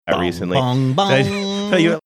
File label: singing first notes theme